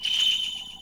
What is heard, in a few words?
jingle; Rayearth